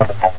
clop; Hexen II

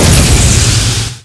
Pad explo; RivalBall for Macintosh

